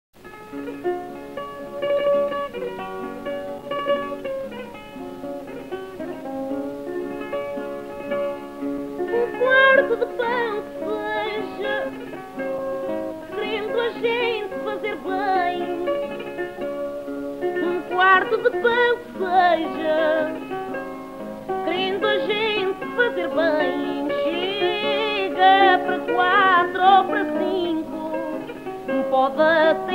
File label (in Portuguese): Fado corrido